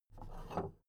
big plastic case handle take

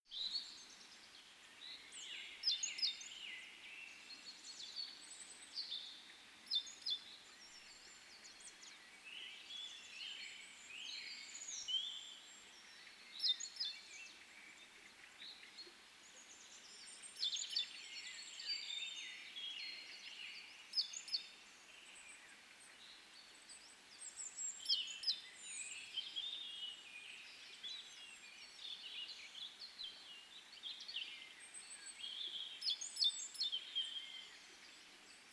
forest daytime

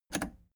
plastic button scratch click enable fast

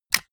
click

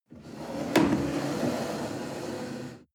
chair wood slide on floor soft bump

